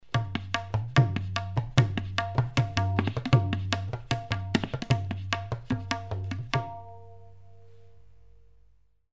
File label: Tabla solo